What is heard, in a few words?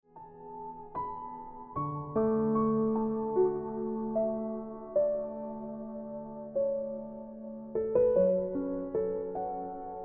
Into The Woods Ringtone Piano Music